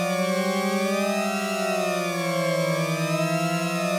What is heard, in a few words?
Lab Alarm